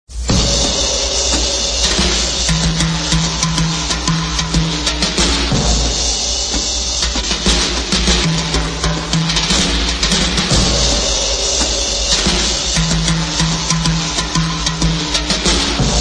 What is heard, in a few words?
drums